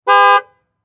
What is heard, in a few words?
Transportation Truck Envoy Honk Horn Medium